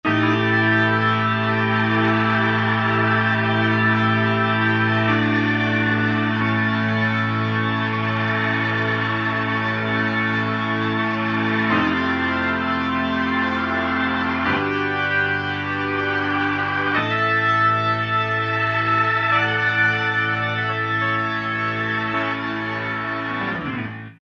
6 Bars with Percussion 2nd - Slow to Fast Leslie - with Medium Distortion, Rock Keyboards; Greytsounds Philip Wolfe's Rock Keyboards